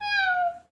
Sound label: cat calm